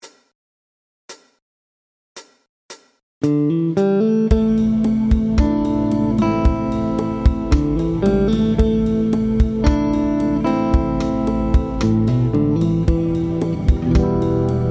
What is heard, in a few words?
chill out song